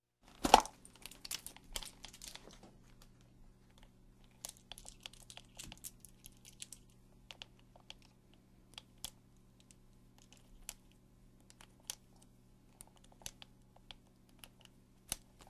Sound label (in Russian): Kobura berut oruzhie kladut